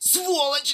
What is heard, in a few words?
killed; monsters; stalker; death